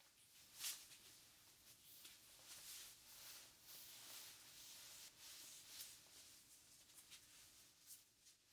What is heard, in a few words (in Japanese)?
スカートを穿く; 動作系